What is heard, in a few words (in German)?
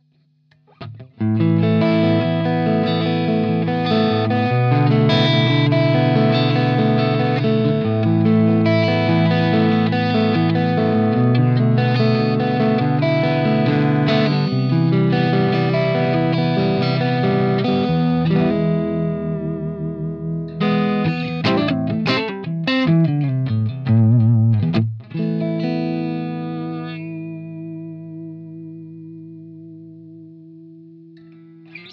Sound Ohne WAH